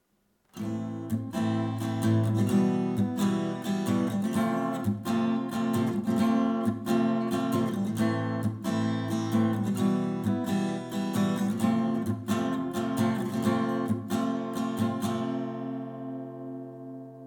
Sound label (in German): i v VI A Moll